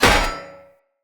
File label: Sphere Board Impact Default, Shields; Weapon Impacts; Sphere Sword And Board; Environment